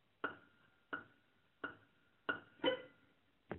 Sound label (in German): pincode Klavier; Daniela Fellner